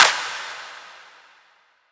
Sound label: Bright Tail Clap; House; Drum One Shots; Claps; Cymatics